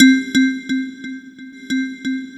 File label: C3, Synth Line; 90 s Sample CDs; NiceBeats; SynthLine VOL-2